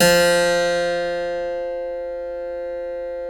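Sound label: F2 clavinet right, Keyboards Acoustic; USB Soundscan vol.17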